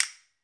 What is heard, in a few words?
Casta, Clave; Club-50 - Foundations Roland; Wood